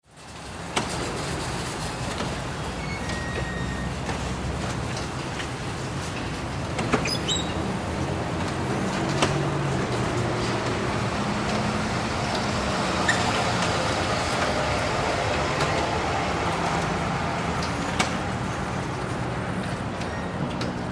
Store Parking Lot Supermarket Shopping Carts Traffic; Stores And Mall; Ambience; Stores and Malls; Blastwave